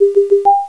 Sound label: door